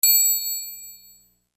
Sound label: Triangle; Sonic Foundry (Sony Creative Software); Infinite Soul VOL-1